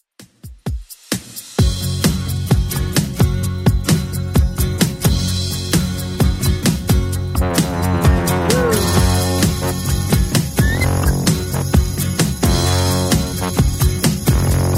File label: 70s Redrum 130 bpm CLEAN